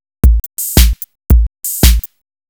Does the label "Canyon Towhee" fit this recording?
No